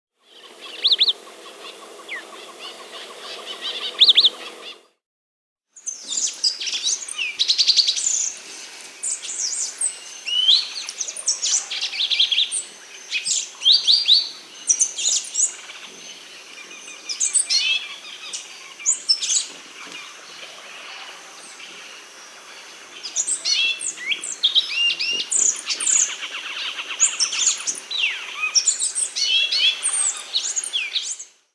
Thick-billed Euphonia (Euphonia laniirostris), Peru; S. America